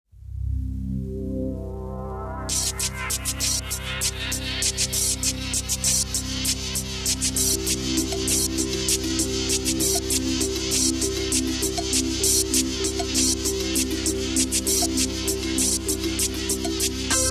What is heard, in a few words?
Juno60